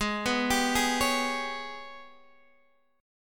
Ab Minor Major 7th Double Flat 5th, down, Guitar, Half Step Down; AbmM7bb5